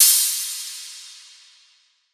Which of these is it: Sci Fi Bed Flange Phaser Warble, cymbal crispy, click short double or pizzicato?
cymbal crispy